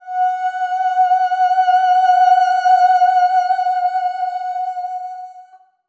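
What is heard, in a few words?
SOP X2 F#5 R, soprano; Spectrasonics; Symphony of Voices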